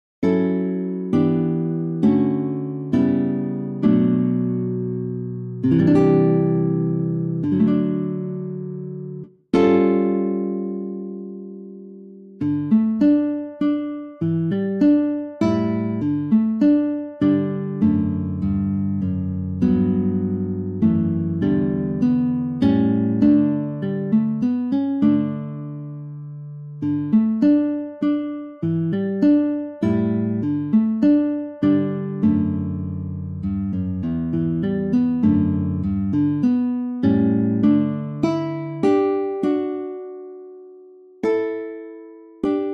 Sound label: variation for guitar; good king wenceslas